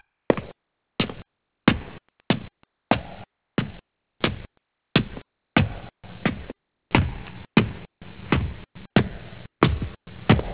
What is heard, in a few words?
stairs, ghost